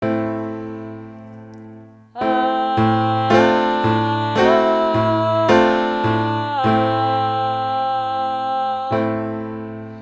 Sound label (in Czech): dvojhlasy piano a zpev